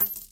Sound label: coin; pou